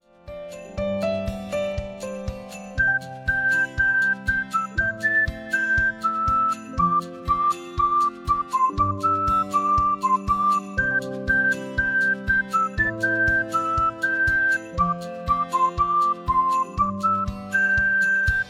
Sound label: Pop Background Lively, ringtone